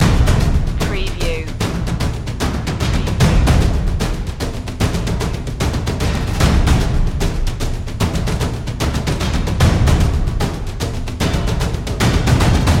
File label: dramatic percussion loop